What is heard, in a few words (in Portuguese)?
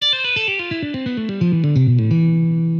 Estudo de ligados 170bpm; guitarbattle